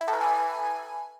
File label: vending jingle